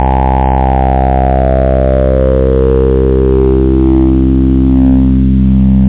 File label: 303 analog; tb303 samples; tb303 bass